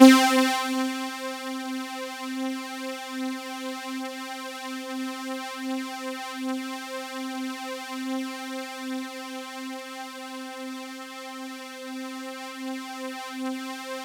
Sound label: C4, Synth Line; 90 s Sample CDs; NiceBeats; SynthLine VOL-18